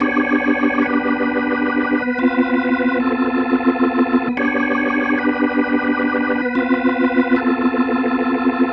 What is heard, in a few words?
Psychedelic, Organ, Loops